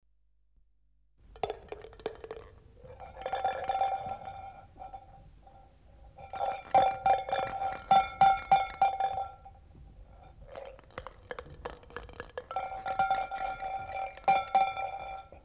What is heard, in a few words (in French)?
billes boites